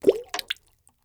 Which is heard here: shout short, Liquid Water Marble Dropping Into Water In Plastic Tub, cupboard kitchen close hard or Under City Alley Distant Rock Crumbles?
Liquid Water Marble Dropping Into Water In Plastic Tub